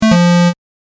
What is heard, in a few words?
correct; ファミコン風のピンポン。中音ver; 正解の音・ピンポーン